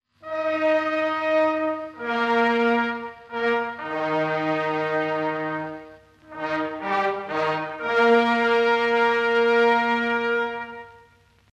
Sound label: fanfare; bruckner